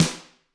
GRETSCH SNR, Double Platinum Drums; ILIO; Double Platinum Drums 1

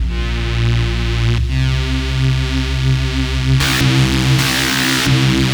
Som Bass, Drum n Bass

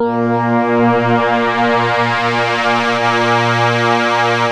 A#, Synclav FM; Big Fish Audio; SPACE1

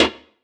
perc organic slap

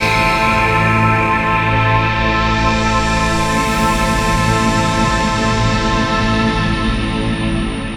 Monster stack (single chord)

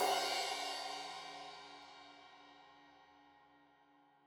Crash 15in L edge, Cymbals Stereo

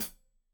Hat Foot, Hi-Hat, Cymbals Stereo

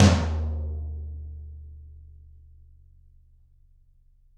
Toms, Drums; Bob Clearmountain Drums I

